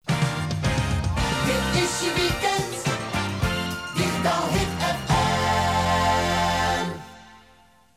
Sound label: Jingle; radiopiraten